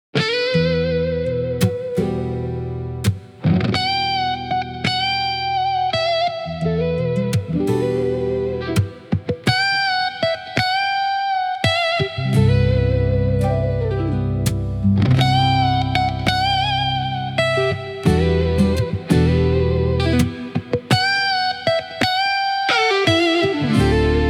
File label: Flamenco